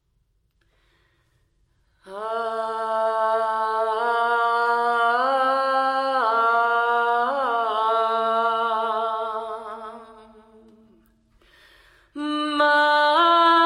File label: Laughing Meditation